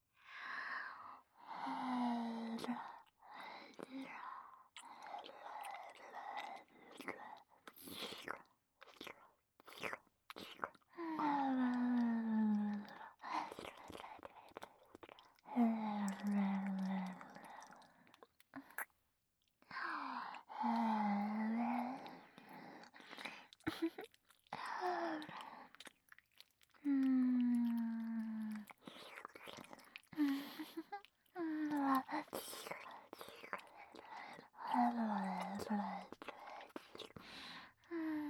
エンドレスフェラ, ASMR

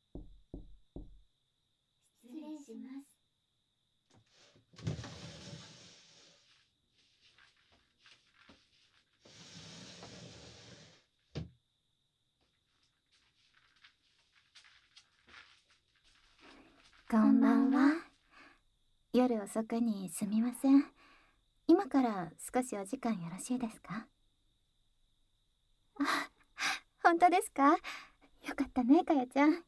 癒やし W 耳かき フルコース, ASMR

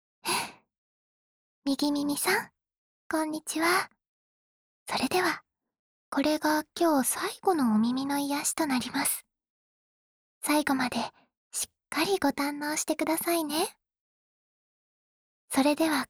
涼やか美人 優しい右のお耳ふー, ASMR; 涼やか美人、桜湯の優しい右のお耳ふー

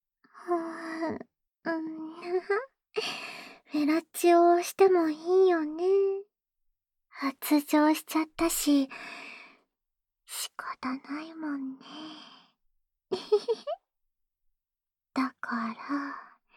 フェラチオループ; オナサポ用

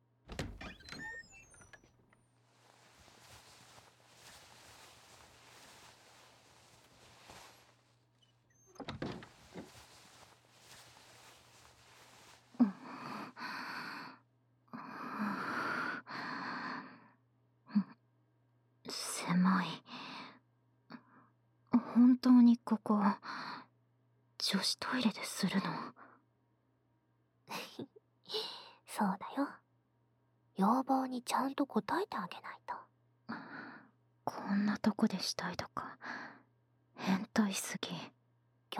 女子トイレで二人に挟まれながら密着ダブル耳舐め

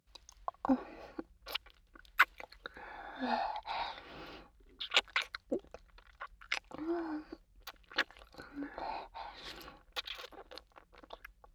ねっとりキスループトラック

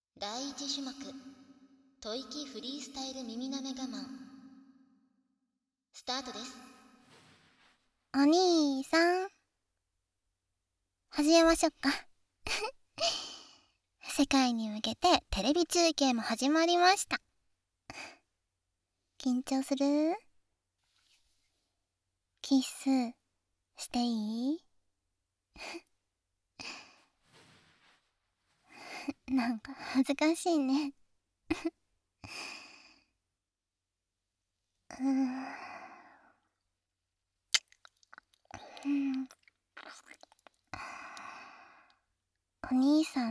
吐息フリースタイル耳舐め; 第一種目「吐息フリースタイル耳舐め我慢」～恋人耳舐め誘惑と耳元システマでチンポ限界～（ナビ有り）; アクメナビ版

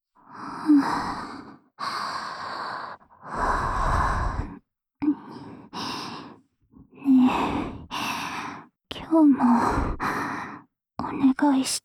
耳舐め ループ （左耳）, ASMR

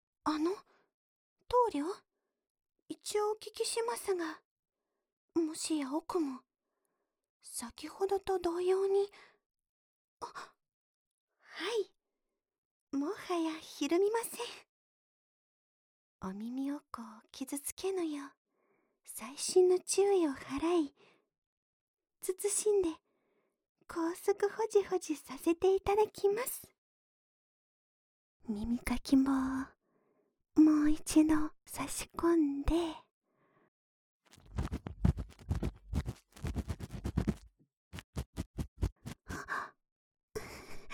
右 奥高速耳かき, ASMR

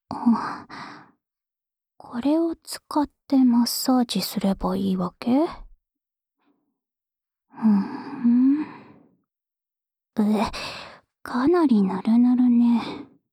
安眠用オイルマッサージループ（右耳）, ASMR; 桐那の安眠用オイルマッサージループ（右耳）

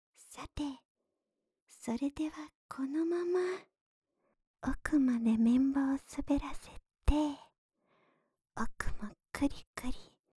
【左】奥ゆっくり綿棒, ASMR; 奥ゆっくり綿棒(元右)

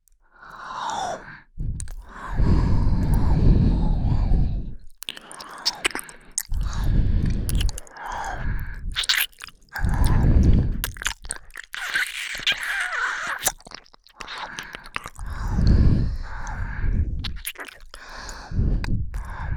二人で耳舐め; 二人に犯され

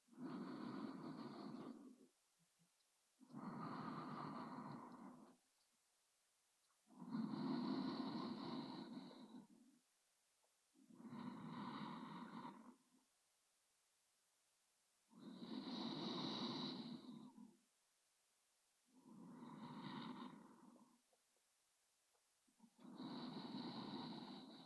寝息ループ音声（右で添い寝）; 安眠音声ASMR

